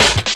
Fill, Drum Loop Hits; Percussion Hits; Hard Stepz

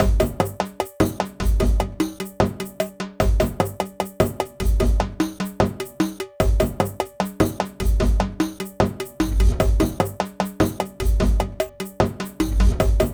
World Kit More 75, Percussion Combos; M-Audio; Pro Sessions VOL-38; Abstract World Fusion 2

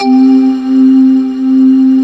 BOOTTLE C4, BOTTLEPAD

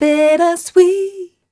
f mel e flat, fem Vocals

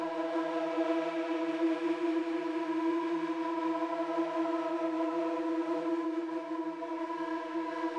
Drone; stereo toolkit samples; Non Tempo Loops

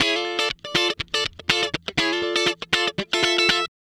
Guitar Licks; Best Service; ProSamples vol.17; AKAI

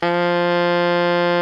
BARI MF F 2, Sax; Giga Samples Collection; BARI QUICK

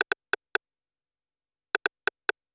RIM, Techno 138 BPM; Best Service ProSamples vol.54; AKAI; DAVE CEE

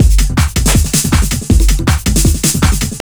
JUNGL 160, JUNGLE LOOP

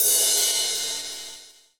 Crash; Equipped Music; Loungin House

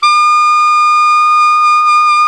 SAX ALTO MP, Tube

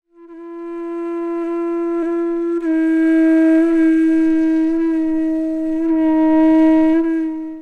FLUTE D, Indian Traditions

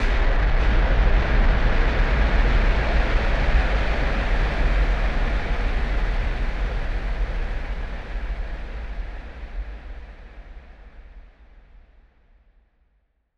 Noise Bomb Rumbler; Noise Bombs; musicradar; bomb factory

